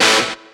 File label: DR. LOOP, BUZZ; X-Static Goldmine 3